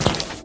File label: SFX Footstep metal; this is the zodiac speaking 2020 gamerip switch ps4 xbox one pc macos